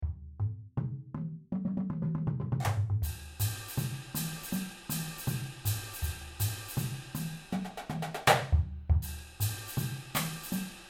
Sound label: PERC; Carmen; CARMEN SHOW